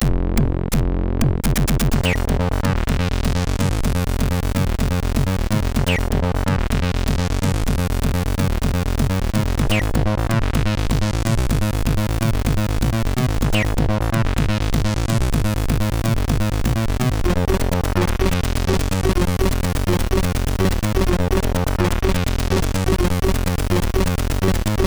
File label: Techno sid MOS6581